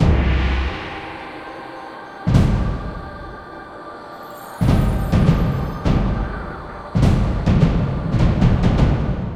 freespin Outro Loop; parthenon

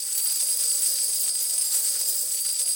coin Loop; reef raider

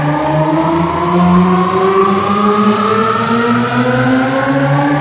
shepard; spinsane

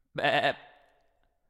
sheep; the wolfs night

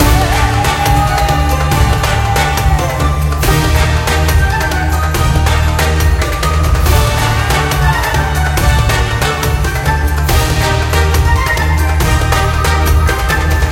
mega Win Loop; wild bazaar

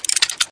key Symbol Rotates UI; hiddencoinsofegypt

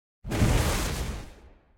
scatter Win

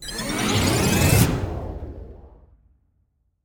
wild Win; multiplier mayhem

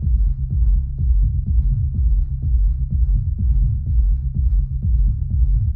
main music loop idle; spinsane

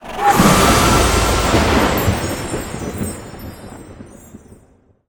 win super mega win; rise of maya

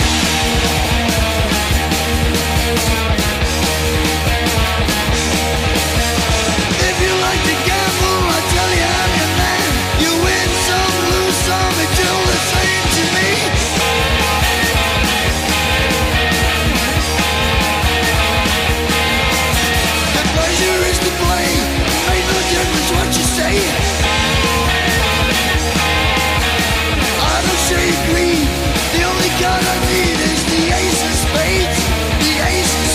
free Spin

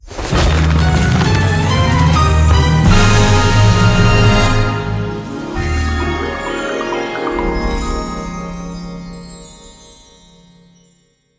intro Bonus Game; secrets of christmas

